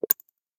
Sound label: swipe unlock; G7; ui; LG